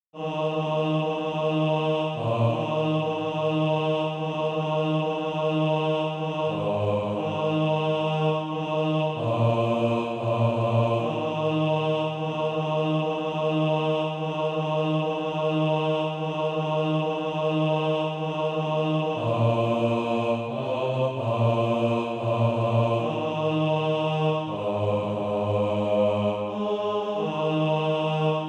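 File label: bass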